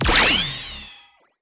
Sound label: Small Cone